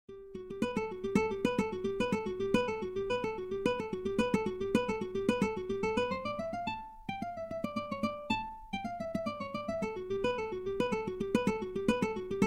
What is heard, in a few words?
Waltz for mandolin; Minute Waltz; Chopin